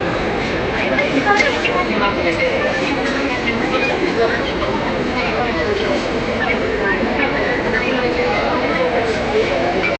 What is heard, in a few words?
crowded airport atmospher